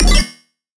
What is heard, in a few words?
Bass Cile, Dubstep; Bass One Shots; Bass X Cile2; Ghosthack; Dubstep Sound Arsenal; 50 Bass One Shots